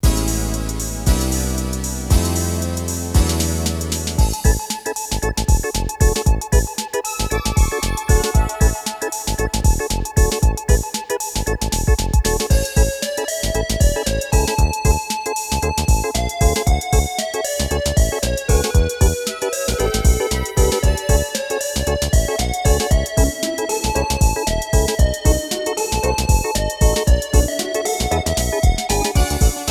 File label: Ending BGM